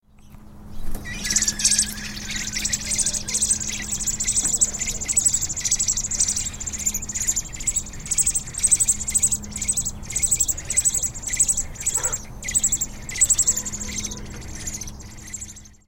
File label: baby twitters